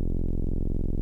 ミニ・モーグ, 電鳴楽器; 最低音４音色

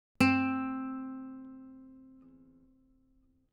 撥弦; 弦鳴楽器; 河野賢; C4

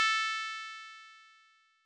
調波構造音（10～30本） 高域, 仮想ピッチ; 音の高さ; 高域下がり・時間減衰波

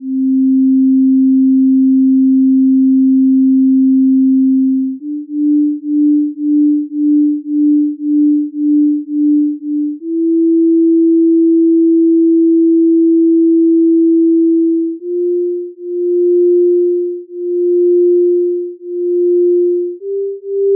純正律とのビート音階, 基のみ; 付録D：音階・音律

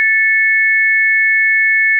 正弦波（定常）2本, 仮想ピッチ; 音の高さ

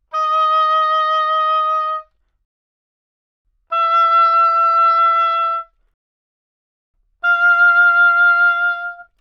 オーボエ, Double Reed; 気鳴楽器

